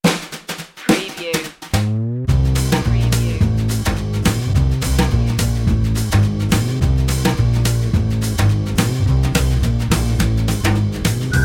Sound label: magic whistle variation